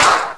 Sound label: Balloon Pop; A balloon popping (also heard when a Bonus Round ends); zelda